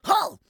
Chinese Tower

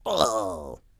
Blow Pipe Assassin; Rabbids Multiverse

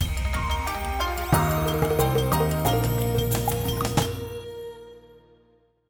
Level Complete; Dynasty

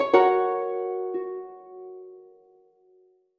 level completed; Spirits; Android iOS Linux macOS Mobile Windows

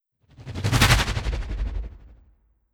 Stone Cyclone; Necro

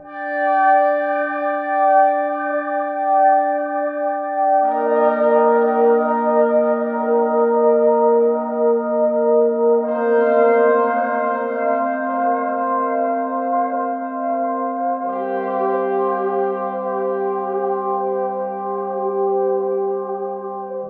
Sad motive

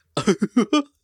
Yelp; Fat Grubfather

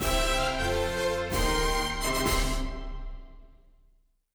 Jingle Ranking Up; Pbff Music#58 (Jingle Ranking Up)

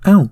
Chorus hurt

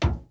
Footstep Metal Hollow

